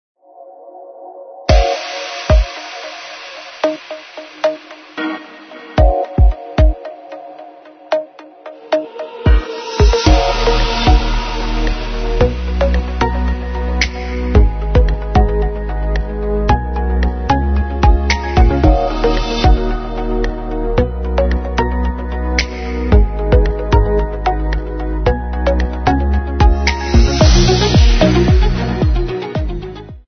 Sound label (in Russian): melodiya